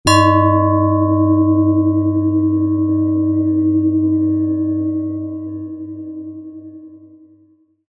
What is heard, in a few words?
metallic rough